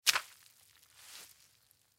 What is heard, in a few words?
Household Mop Wet Smack On Floor; Cleaning